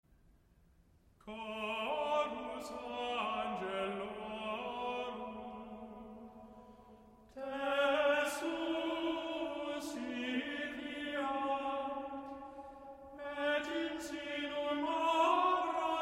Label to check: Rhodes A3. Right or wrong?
Wrong